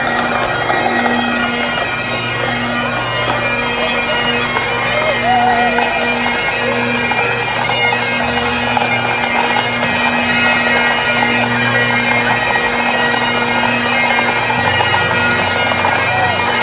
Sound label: Bagpipes Tuning Up; Space Pipes